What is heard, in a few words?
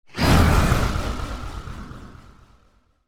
Sorcery spell dark orb